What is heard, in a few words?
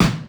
Rifle Fire Synthetic